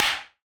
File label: Crab snap